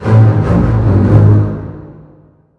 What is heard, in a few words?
charger bacterias, music; Left 4 Dead 2; Source Engine